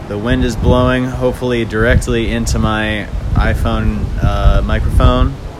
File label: De Wind iphone BEFORE